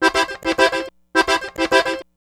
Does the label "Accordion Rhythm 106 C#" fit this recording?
Yes